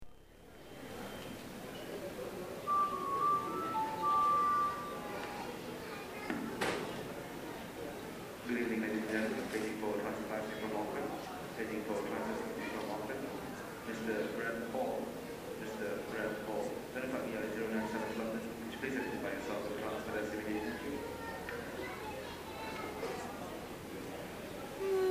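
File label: brunei airport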